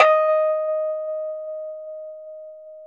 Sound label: Rhodes 4D#; 3D Sound; 3D Keys VOL-1